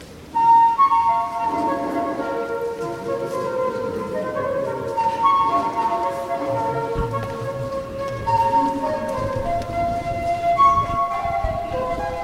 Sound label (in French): flute sortie